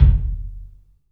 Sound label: KIK, LIVE CLUB KK, Drums; 3D Sound